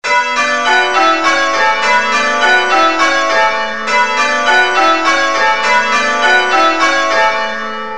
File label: Kings, changeringing